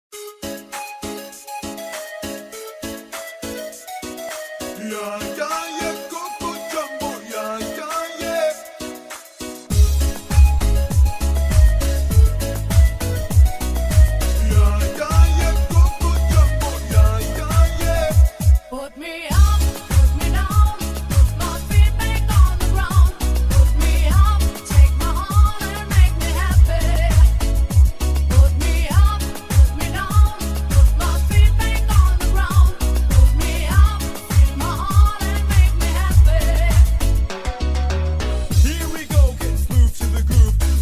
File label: bass boosted